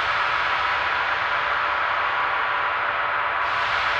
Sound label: sparse soundscape samples; ReLooped